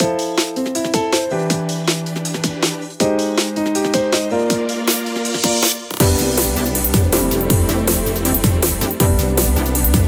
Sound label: subgame dance song